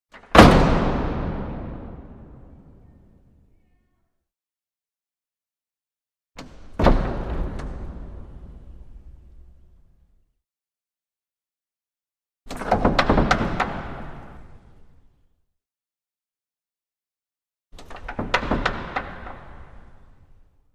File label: Large French Doors, glass